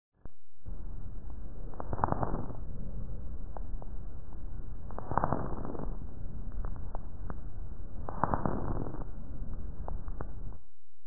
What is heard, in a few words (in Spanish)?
Crepito Adulto